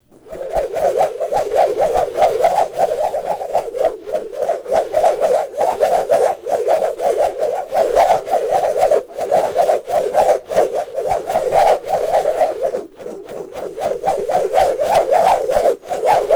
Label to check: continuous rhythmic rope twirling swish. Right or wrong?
Right